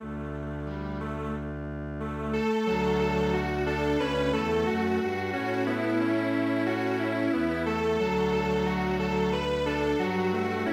Strings Quartet

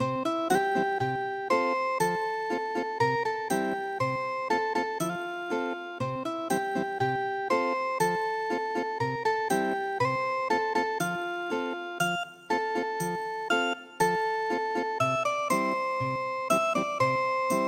ethnic guitar; KUKULECZKA

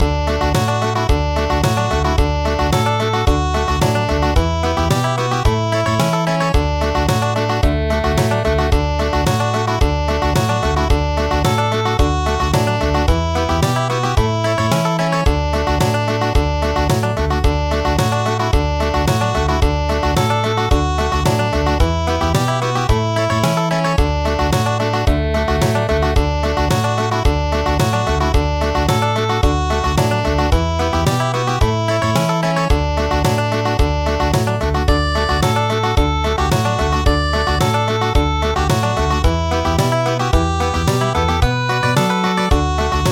Country; Village dance gangar

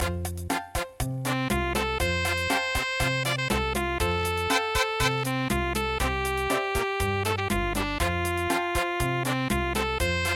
The Stage Coach chachacha

